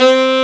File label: saxophone; ST-XX Amiga Soundtracker Sample Packs